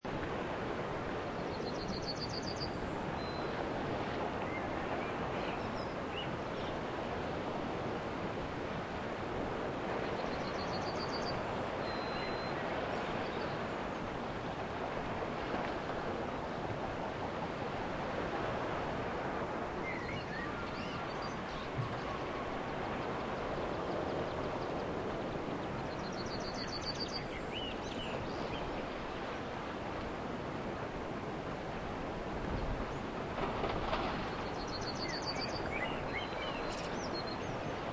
Birds By The Sea Daytime, Few small birds singing at the seaside, very light breeze and gentle; Few small birds singing at the seaside, very light breeze and gentle ocean waves; Bird Sound Effects